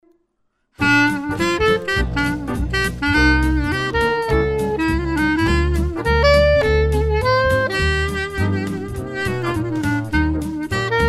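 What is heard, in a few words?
clarinet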